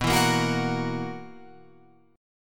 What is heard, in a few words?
B Major 7th Suspended 4th, Guitar Open F chord; BM7sus4; strummed; 11,11,10,13,13,13